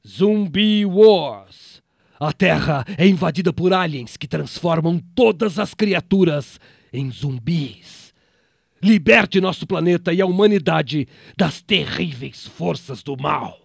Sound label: zombie